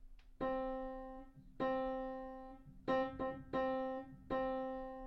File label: rhythm; dog dog hopper grass dog; rhythm train book 2; strawberry